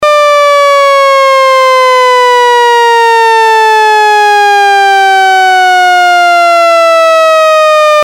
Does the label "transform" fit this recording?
No